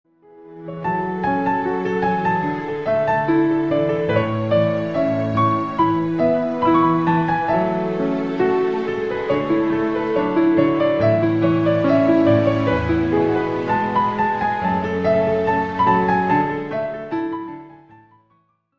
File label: Lullaby Piano; TWILIGHT SAGA